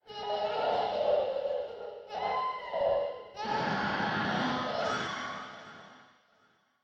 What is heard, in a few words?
child crying; trtf 5; bfp; lockjaw